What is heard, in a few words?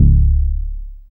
Bass SH-101